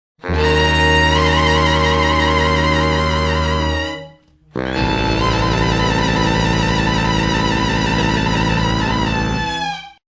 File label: Horn Intro